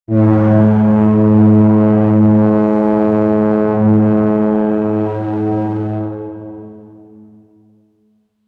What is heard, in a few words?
a cruise ship horn sound; watercraft